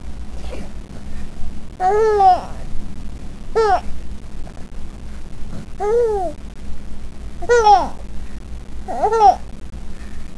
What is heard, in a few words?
laughing; 5 months